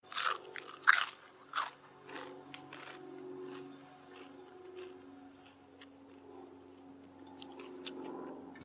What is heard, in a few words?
Chip Crunch